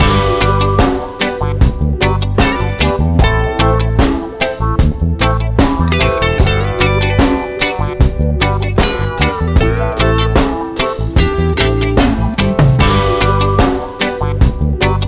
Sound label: Lost stage